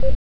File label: button